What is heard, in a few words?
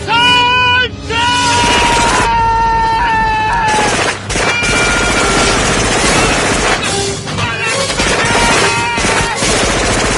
gunfire; predator 1987